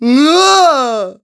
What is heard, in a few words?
Whine Yell; yelling; Skyward Sword